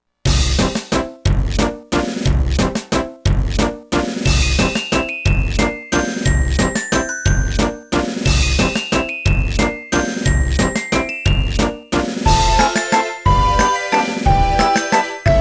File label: Cricket Waltz; atelier lina the alchemist of strahl original soundtrack